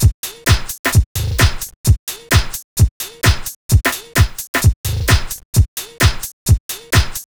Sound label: Club Dance Beat